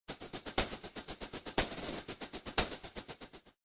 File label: Shaker